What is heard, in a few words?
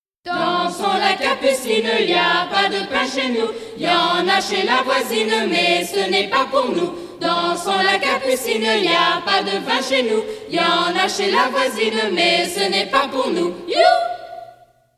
Traditional Song - Dansons la capucine; Bien-dit level 2